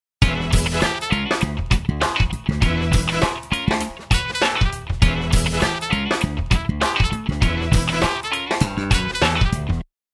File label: strings mix